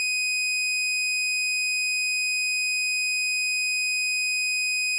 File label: saw 2.5khz -20db; saw wave